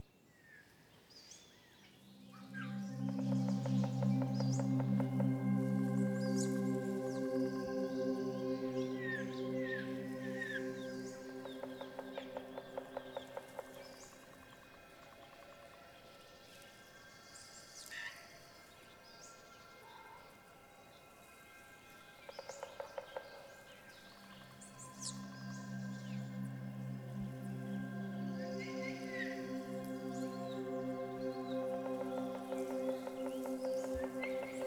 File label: amb golden tree of peace; lol